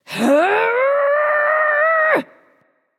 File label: grunt lift; smite; kali